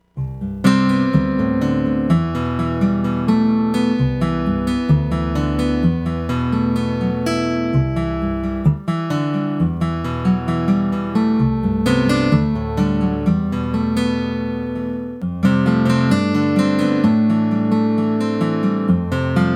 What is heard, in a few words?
Pure Acoustic